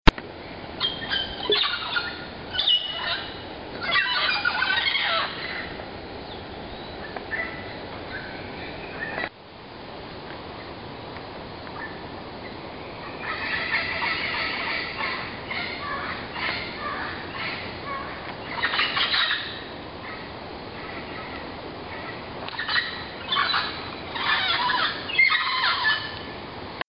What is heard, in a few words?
Toucan; panama